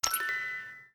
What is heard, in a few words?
gain loot